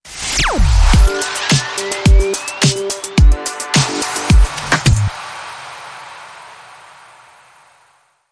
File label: Vox Radio Sub Short